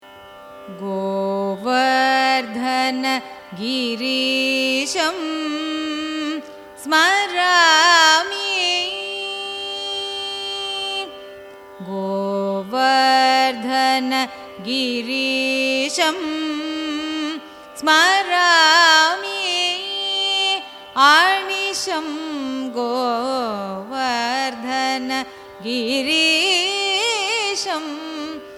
raga hindolam carnatic; govardhana girisham

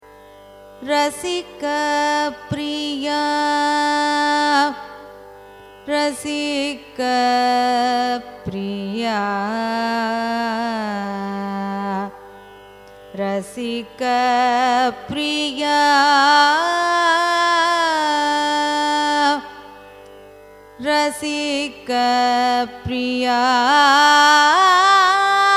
raga rasikapriya signature, carnatic music

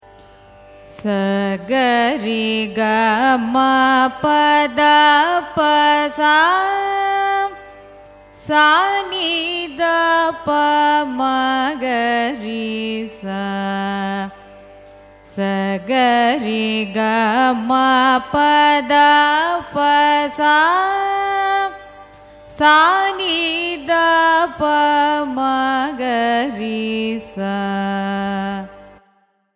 raga ananda bhairavi arohanam avarohanam; carnatic music

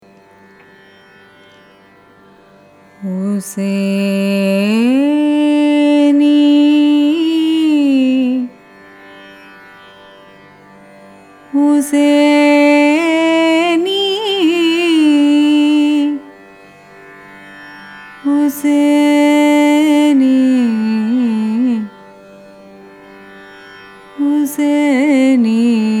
raga huseni signature; carnatic music

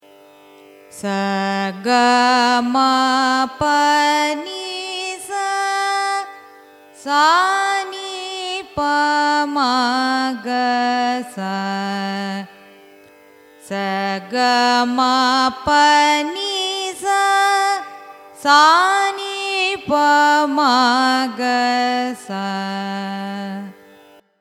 raga gambheera nattai arohanam avarohanam; carnatic music

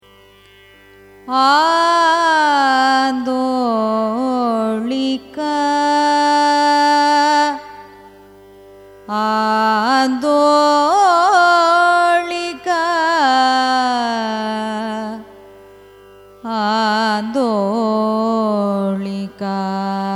raga andolika signature, carnatic music

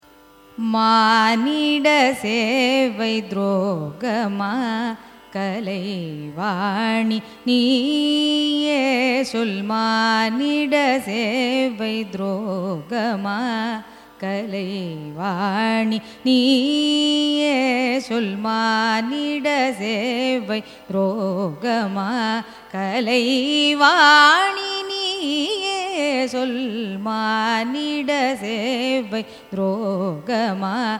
raga poornachandrika cine

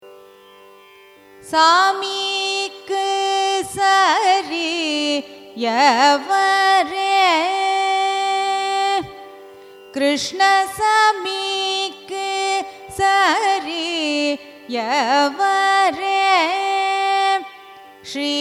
raga kedara gowlai carnatic; carnatic music

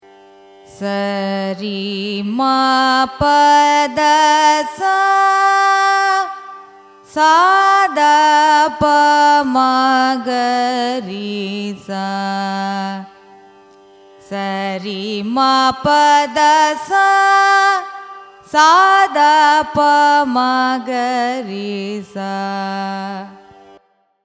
raga malahari arohanam avarohanam; carnatic music

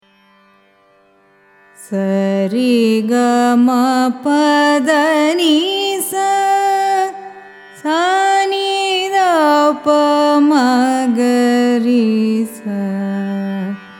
raga sarasangi arohanam avarohanam; carnatic music

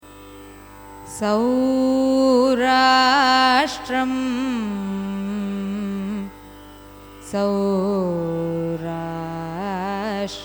raga sowrashtram signature, carnatic music

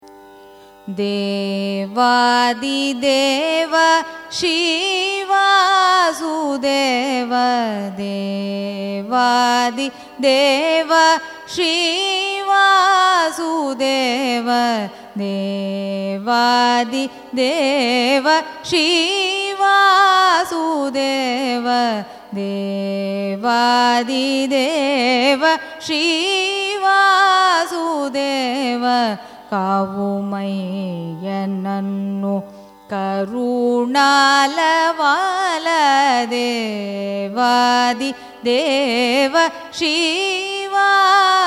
raga sunadavinodini carnatic

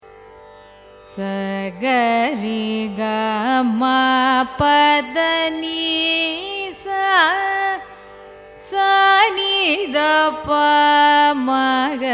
raga bhairavi arohanam avarohanam; carnatic music

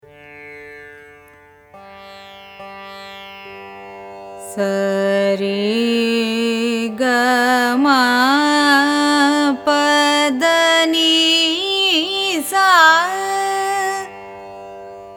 raga nasikabhooshani arohanam avarohanam; carnatic music

